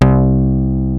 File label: OSCAR BASS, OLD SYNTHS; Rubber Chicken Software Sample Bank